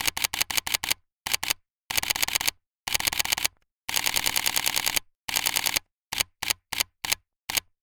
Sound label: Sony A7iii Shutter